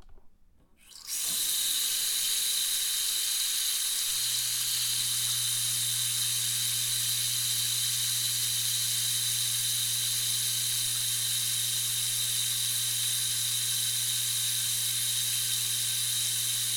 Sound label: bathroom sink; fvtt sonniss gdc audio